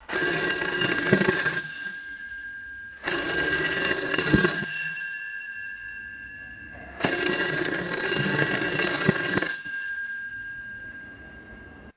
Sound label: vintage mechanical warning bell ringing; vehicles